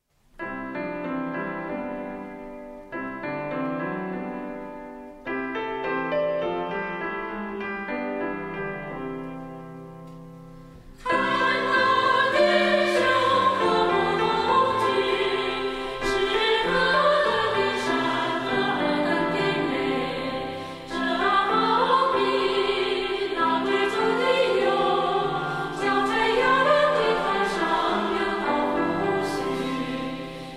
诗篇一三三篇

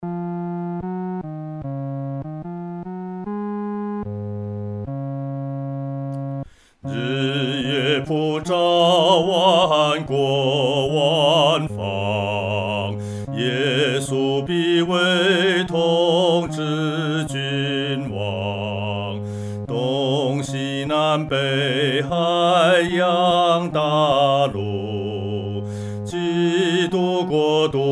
独唱 第四声; 主治万方

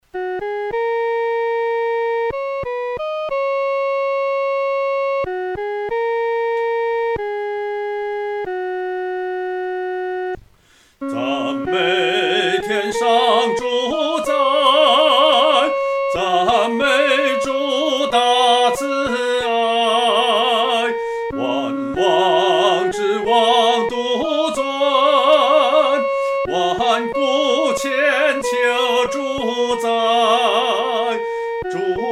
独唱 第一声; 赞美天上主宰

